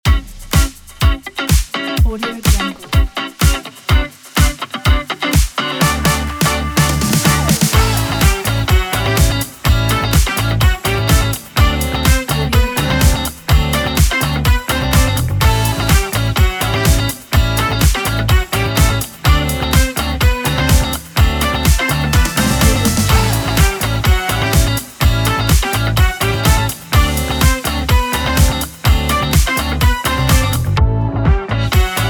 funk disco uplifting

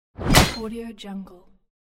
axe hit; tibasound

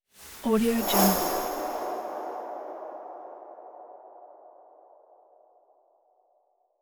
thin airy pass by

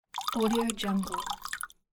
pour water

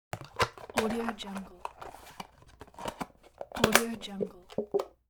closing lid of plastic container

